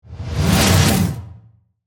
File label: Whoosh Hit Swirl, Heavy, Transition; Production Element, Imaging Element, Accent; BlastWave